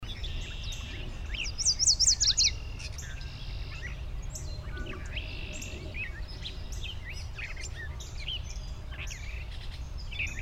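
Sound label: Yellow Warbler, Carroll, Maryland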